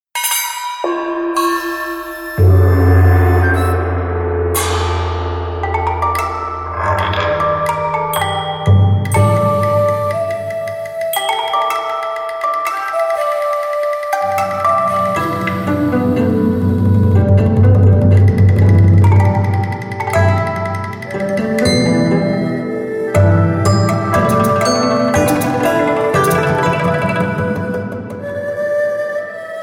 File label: Asia Chinese Mood; ethno